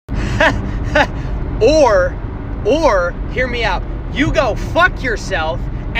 haha

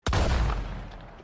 sawed off shotgun, weapon customization; red dead redemption 2; weapons; showcase

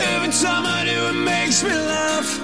laugh

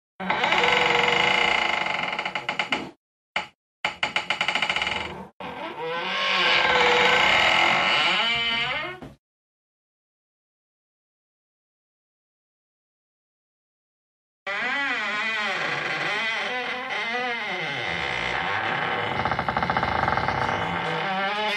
creaky door

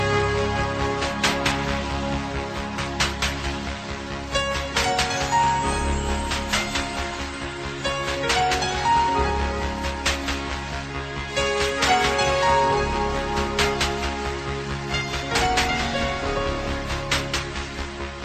slow motion running song

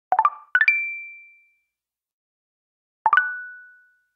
tinder notificacion sound

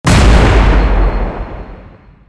tank shot without shell sound; clash of panzer

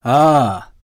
ahhh

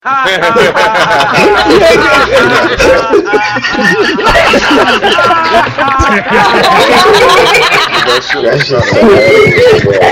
laughs

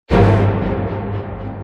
combat; skyrim

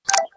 text tone

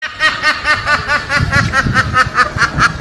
duck laugh

